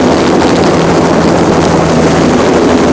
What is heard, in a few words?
Helicopter near; chopper; Max Payne